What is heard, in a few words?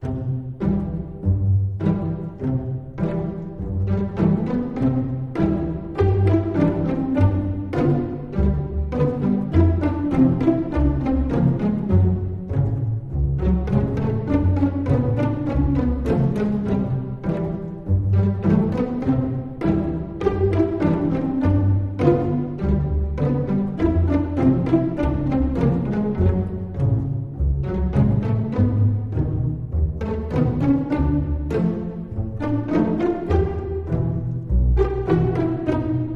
Pizzicato loop; Wind Wolf (Night Breeze)